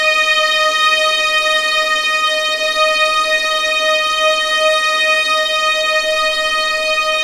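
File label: D#5 legato violins; East West; The Ultimate String Collection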